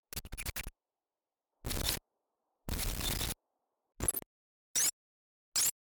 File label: On Screen Glitch; Ui glitch